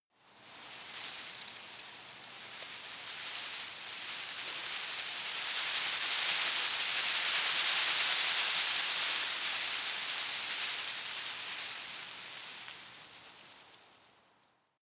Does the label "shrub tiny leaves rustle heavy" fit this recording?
Yes